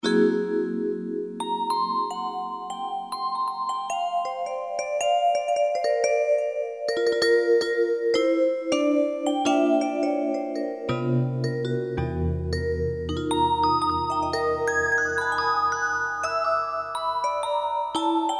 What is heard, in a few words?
Yamaha TG33; PC-Vibes